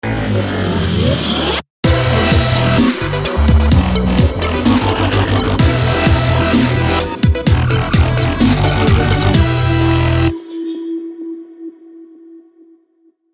dubstep logo; absinthemedia

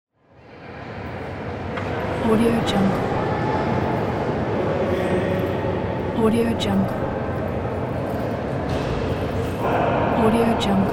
large hall ambience

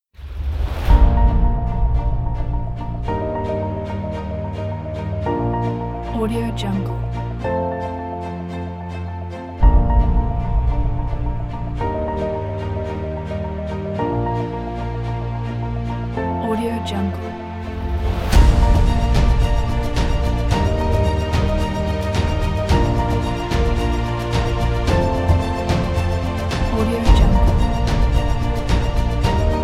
inspirational epic

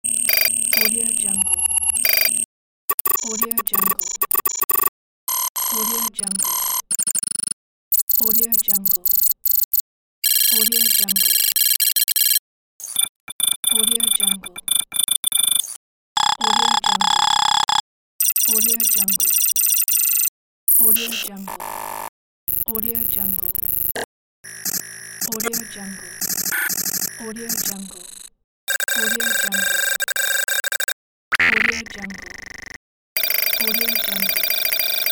digital text

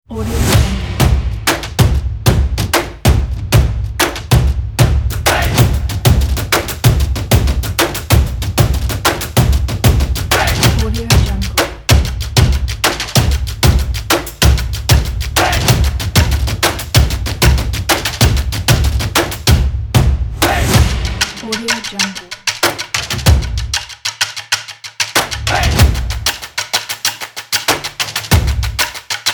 stomp claps music kit

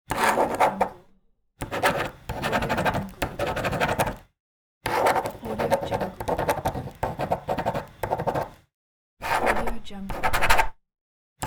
pen writing

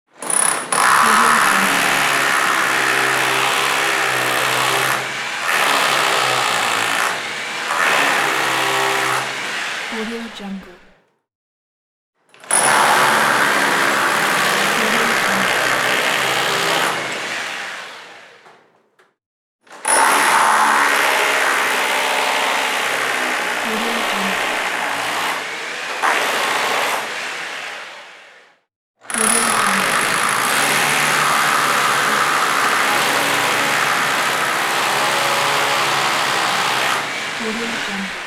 building tool; fatsound

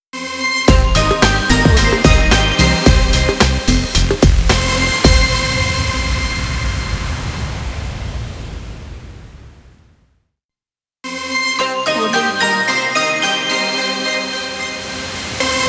intro corporate logo

